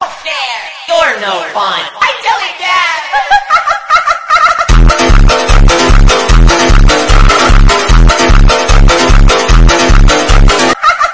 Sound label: funk; c00lkid